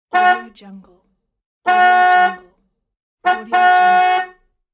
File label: car beep